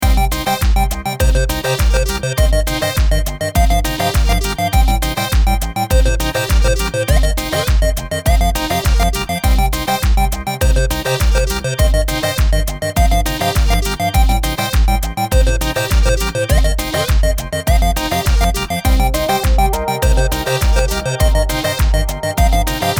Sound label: synthy